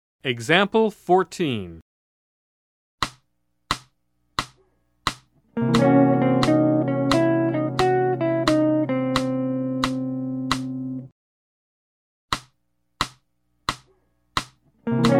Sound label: Jazz